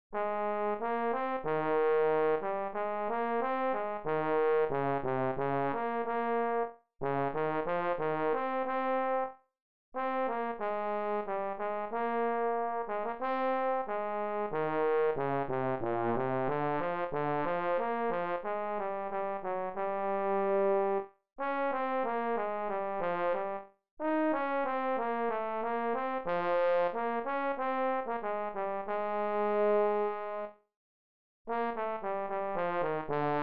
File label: Trombone